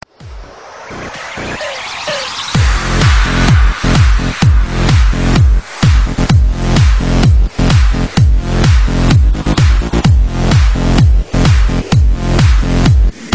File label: New Club Techno